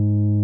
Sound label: RHODES G#1; Sonic Reality - Vintage Timetraveler